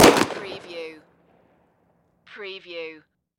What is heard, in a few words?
Pistol 45 Springfield